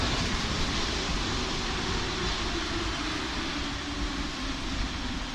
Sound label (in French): freinage, Metro